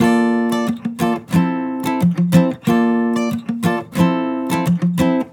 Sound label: Acoustic Chord Loop 180 BPM F Maj; Cymatics